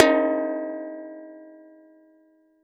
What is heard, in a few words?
Tom